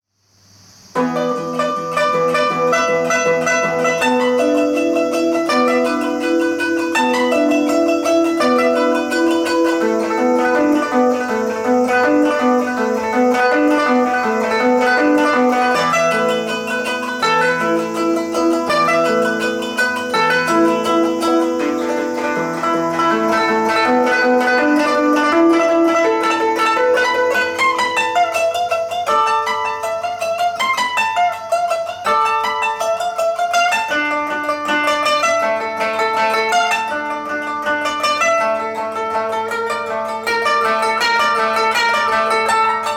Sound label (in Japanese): matsuri no taiko